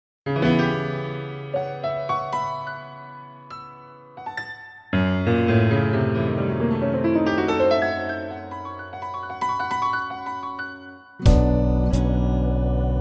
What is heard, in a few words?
brass minus